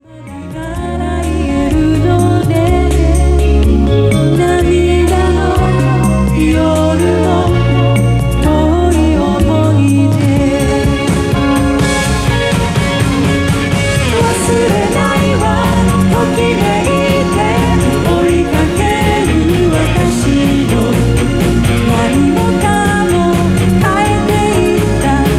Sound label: pop female